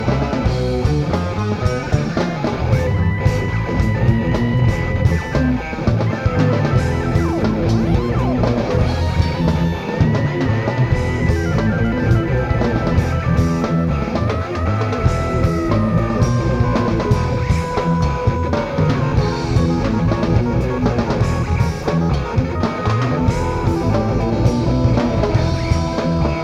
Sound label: Solo